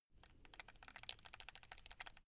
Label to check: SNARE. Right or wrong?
Wrong